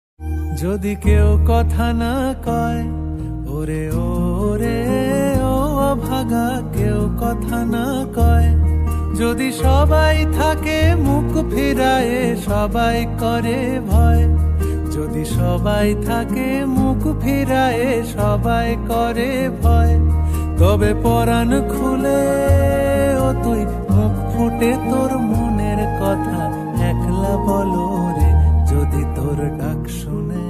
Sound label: rabindra sangeet bangla ringtone new emotional sad ringtone